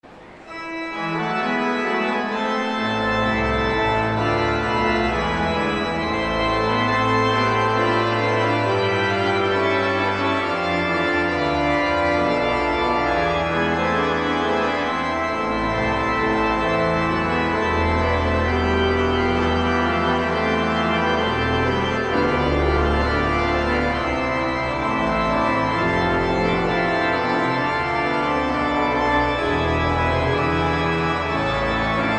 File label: Organ, Chorale Prelude; J S Bach; BWV 64